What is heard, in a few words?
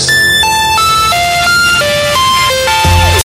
HARSH NOISE DROP